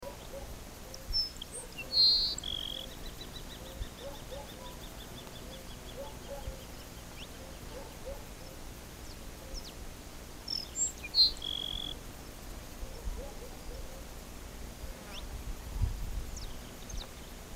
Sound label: grassland sparrow